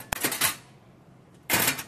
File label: Car Door Open Extract